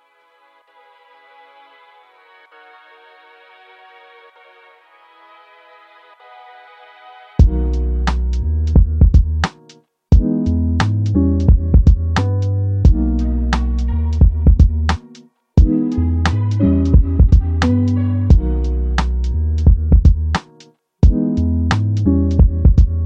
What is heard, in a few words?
jazzy